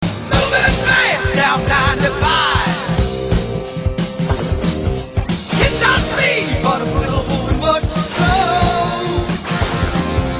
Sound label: kiss; hl2dm